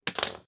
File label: Remote On Floor